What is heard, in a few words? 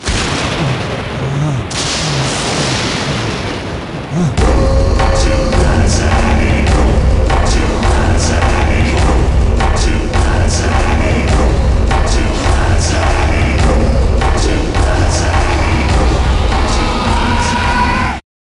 eternal autumn - transition